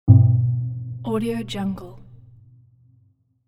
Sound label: hit; magicsoundflower